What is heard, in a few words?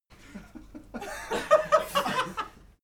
laugh male short